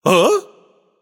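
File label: nonverbal surprised; smite; voicepack; merlin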